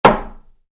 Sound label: Wood hit wood, Wood, Light Impact: Wood; Wood Sound Effects; Wood (Sound Effects:Materials); Stuart Duffield